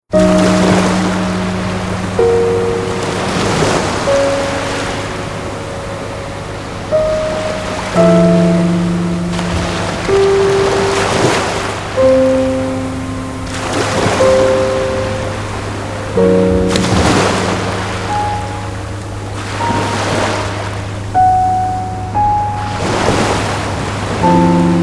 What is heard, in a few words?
wave piano